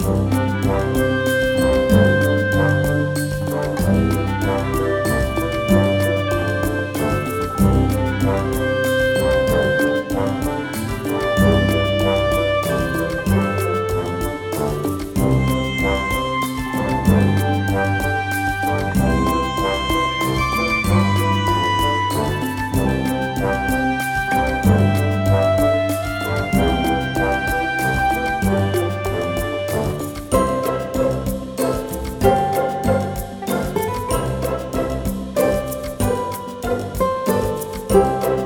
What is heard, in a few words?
Letter Lounge loop